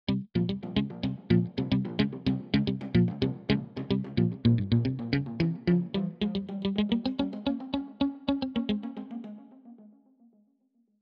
electric guitar backseat driver

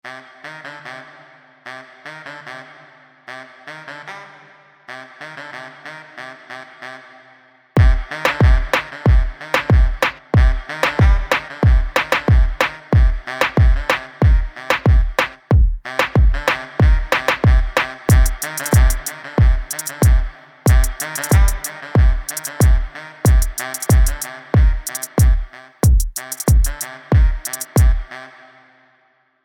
brass baritone

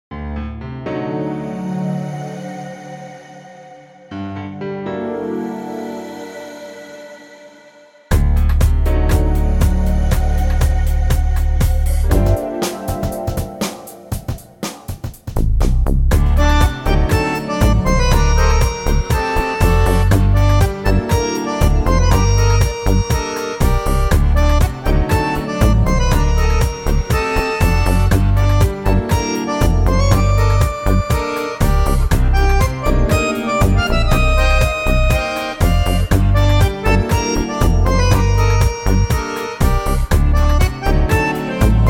nuevo tango

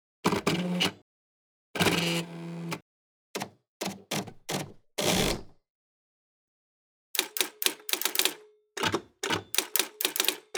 Brother typing

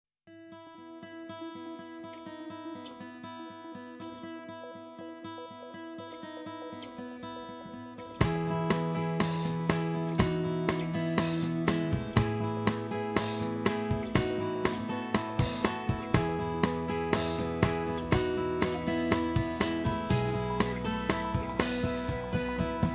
pop mix

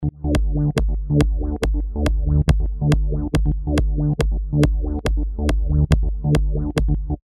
multilfo tone multilfo kick

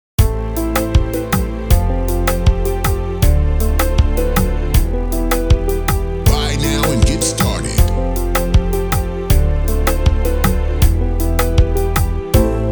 zouk production kit

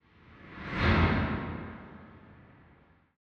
woosh

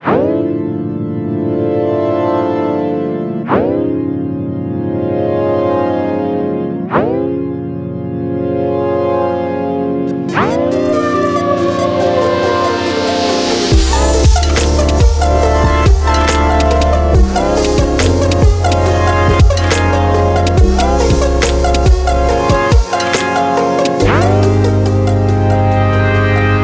wavetable, evil; Ableton

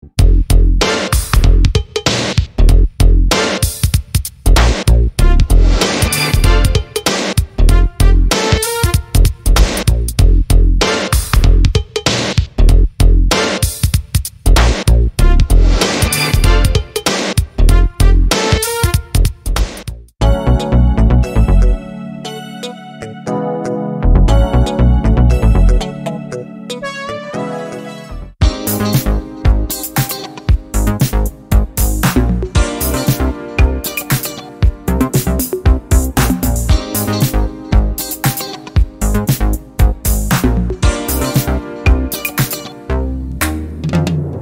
e funk synth

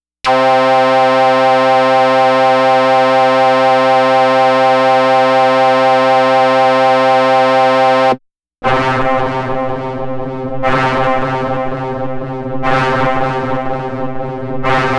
wrecker, alien; wrecker audio examples

